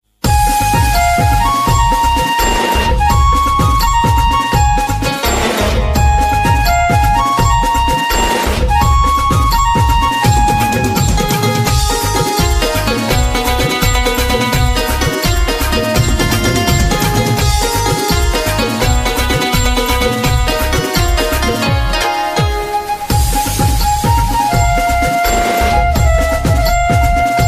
instrumental ringtone

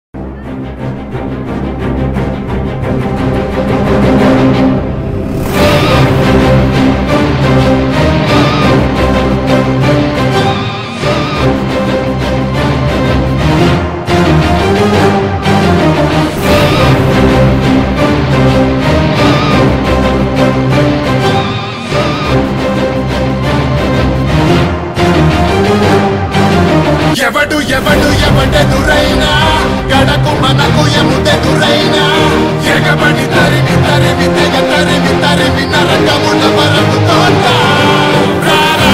background music interval bgm; Gang Leader

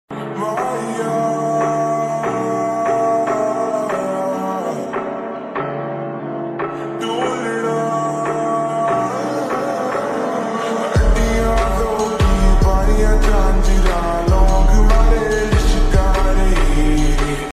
slowed reverb song

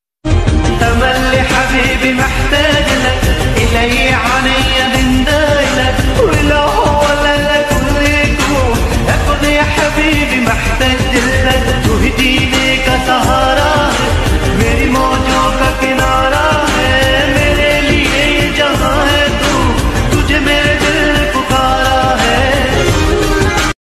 arabic ringtone arabic love ringtone

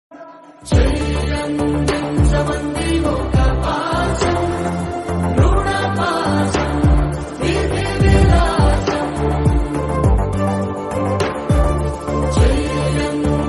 emotional bgm ringtone; bimbisara

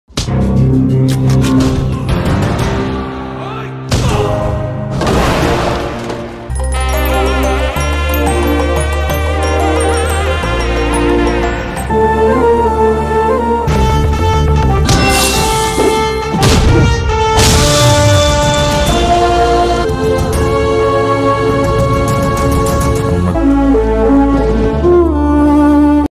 emotional bgm ringtone; tuck jagadish; nani

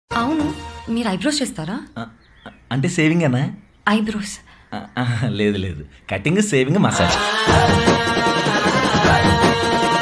ambajipeta marriage band telugu bgm; sushas

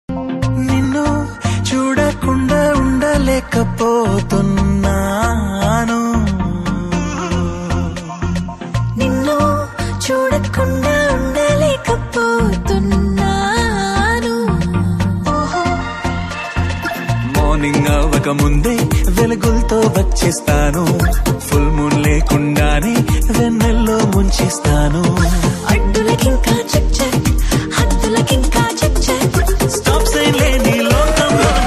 bgm ringtone

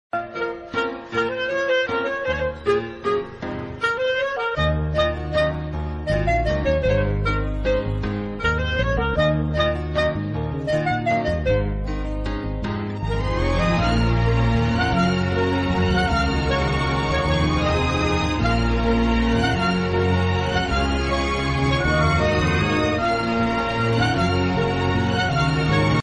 love bgm

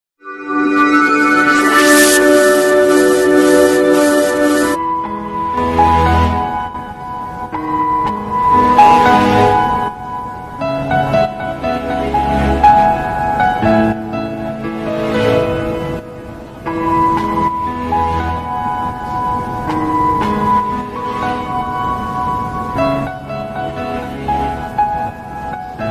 adithya varma song bgm ringtones classic bgm

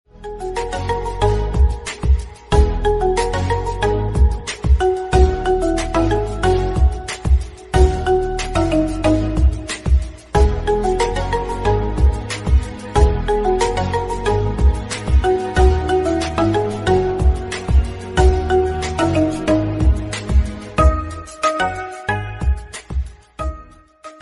instrumental bgm ringtone telugu bgm ringtones; saaho movie

